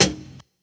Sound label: Hi Hat